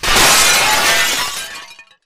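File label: glass break mid distance; Titanfall 2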